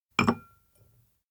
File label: glass place thunk; anemoi